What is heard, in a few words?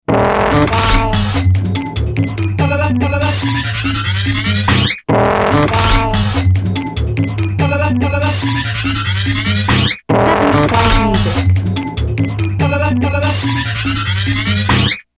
Fun time loop